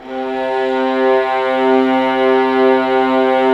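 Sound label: violas, ambience, piano; String Master Series; Vlas Sect3-4; Amb mf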